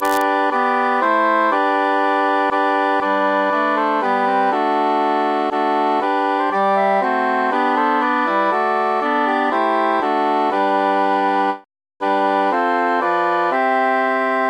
bassus mute; sing we and chant it

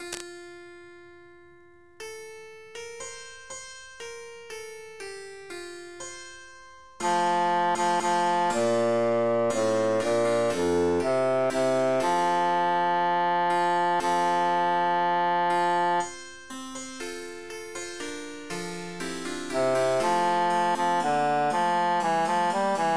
bassus emphasized; fair phyllis

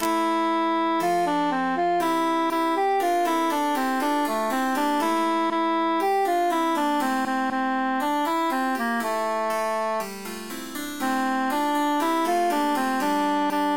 tenor emphasized; iacob scalam

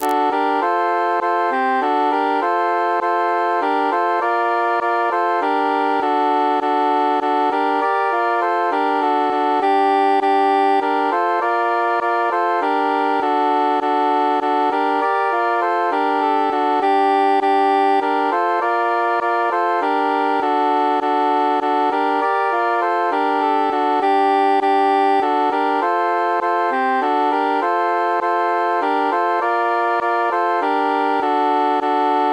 bassus mute

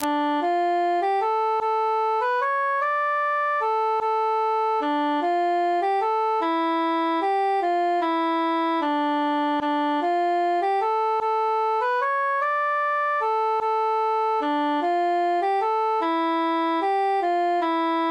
three country dances transposed cantus solo

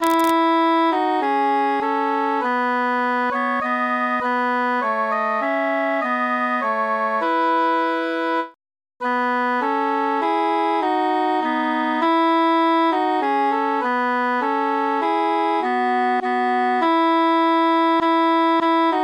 altus mute; transposed; we be three poore mariners

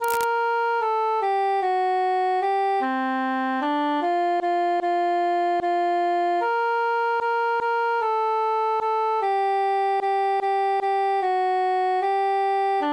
altus solo; fa una canzona

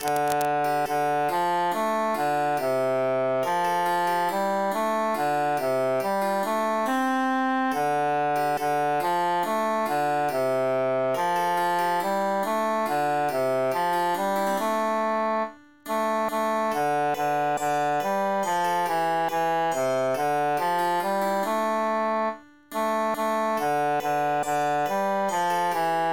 tenor emphasized; stella splendens

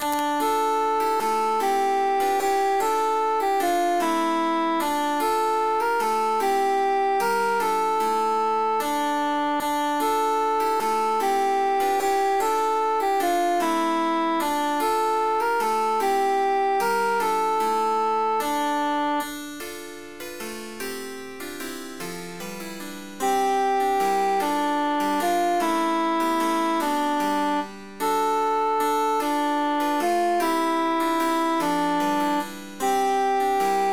three country dances transposed altus emphasized

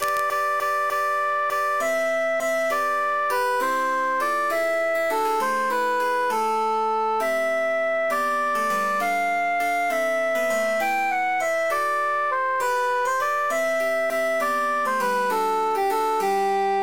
cantus emphasized; though philomela

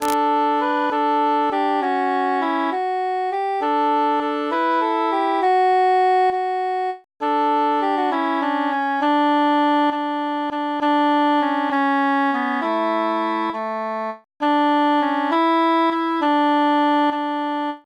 transposed altus mute

